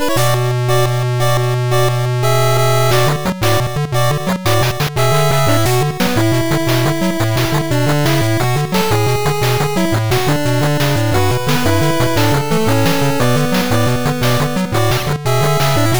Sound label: 8bit Hour Of Judgement